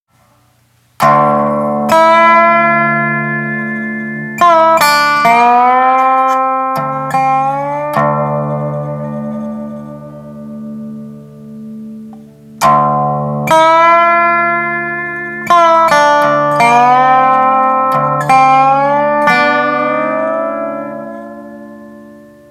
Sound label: Dobro FX